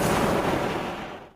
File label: flame thrower end; tf